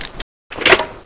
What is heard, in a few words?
rpg reload, weapons; XDM